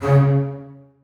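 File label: basses staccato c2; Sonatina Symphonic Orchestra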